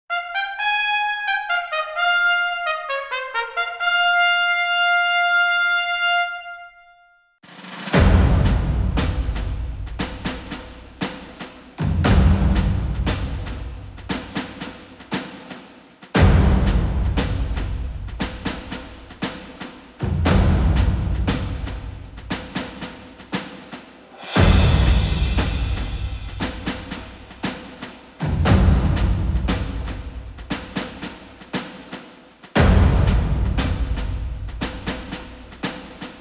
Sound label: Battle; fire emblem three houses original soundtrack 2021